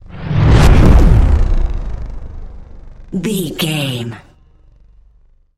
sci-fi vehicle thrusters engage

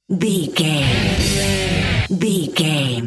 rock power stinger; Sports, Sports Rock, Pop Rock, NFL, Football, Sports Music, Rock, Hard Rock, Metal, Driving, Bikers

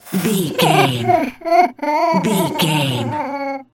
goblin fairy cry whimper

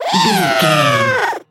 goblin fairy growl

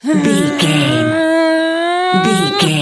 voice female effort push

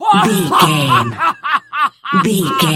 voice male laugh intense

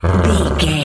dog growl

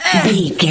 voice female hurt pain

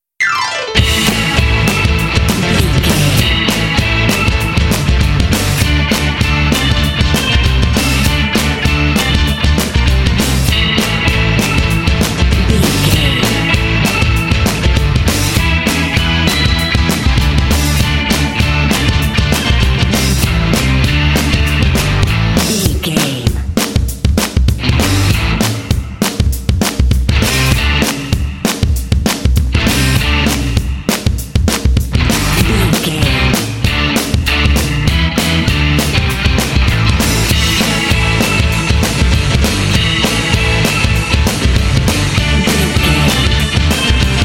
indie rock stars forever

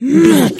voice female growl frustrated

